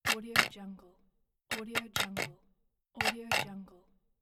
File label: bottle cap